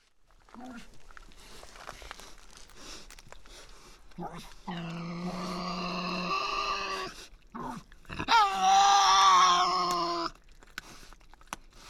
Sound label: Tasmanian Devil